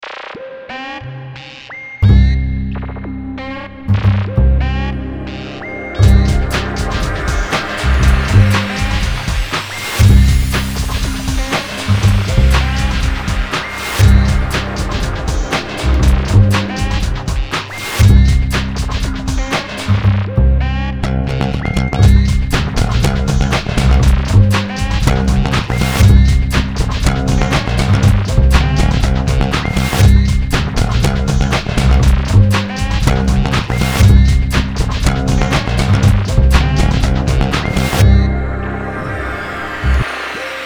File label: tremonia blues